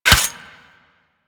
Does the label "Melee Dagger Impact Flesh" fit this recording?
Yes